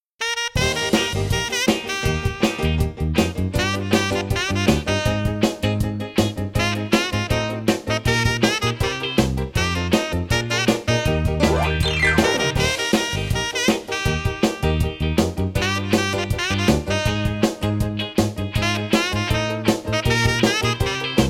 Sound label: instrumental